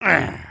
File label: Walk, creatures organic